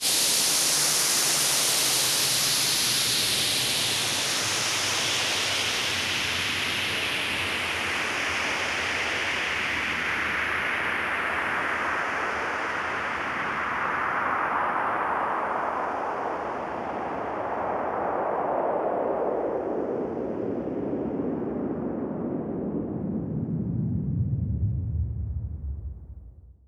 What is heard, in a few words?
Downsweep 140BPM; Sound FX; Vengeance Samples; D minor